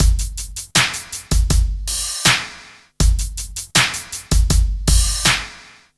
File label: Smooth, R&B Drums